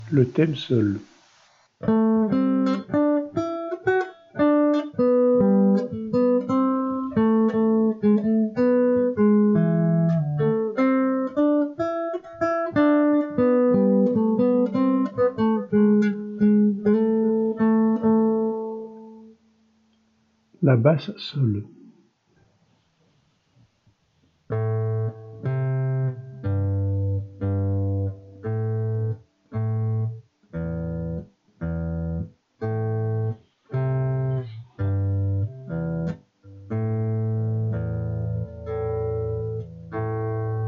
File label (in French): deux guitares